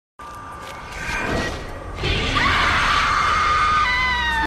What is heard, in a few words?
Scary Girl Killed